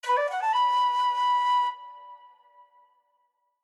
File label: FLUTE UPPER